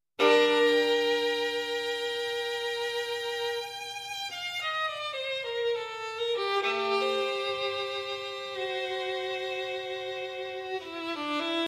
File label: violin